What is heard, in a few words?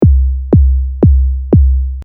kick sine